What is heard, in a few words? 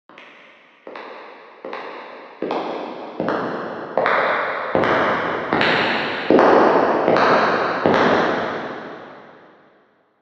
corridor